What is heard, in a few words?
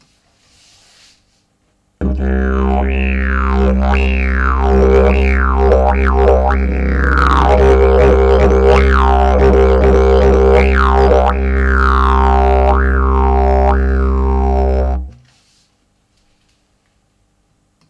Didje Legno